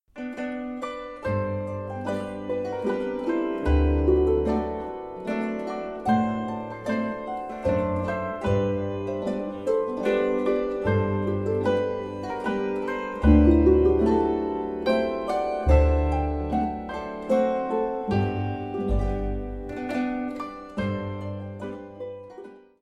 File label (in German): quintett landler